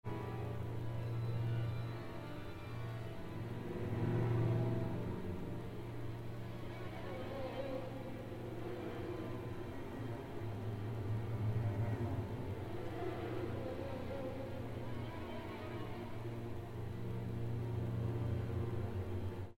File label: tremolos